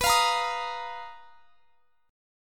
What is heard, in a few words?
B Major 7th Flat 5th B down, chord shape; strummed